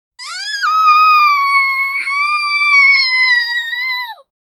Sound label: child screaming and cryin; distress